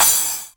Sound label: Crash, Novation Drumstation; DrumstationV1